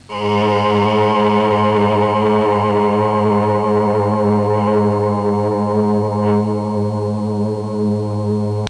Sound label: lo syn vo, electron; Easy Personal Web Page